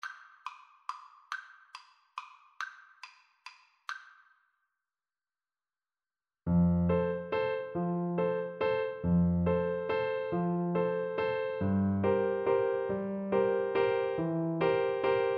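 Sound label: recorder karaoke; blue danube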